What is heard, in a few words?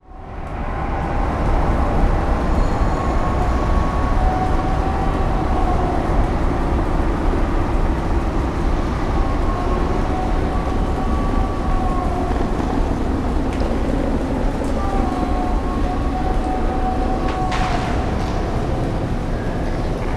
Background Ambience